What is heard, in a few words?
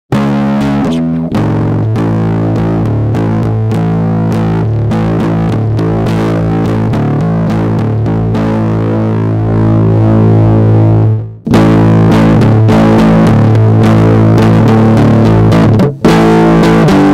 heavy heavier; dr zee se cdc amp head